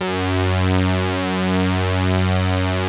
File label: POLY SYNTH; Wizoo - Powered Wave